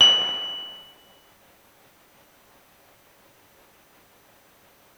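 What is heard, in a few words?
deep F#4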